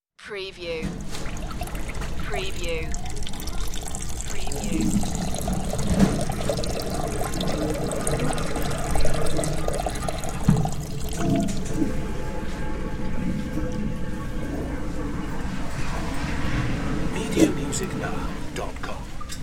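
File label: Urinal Public Bathroom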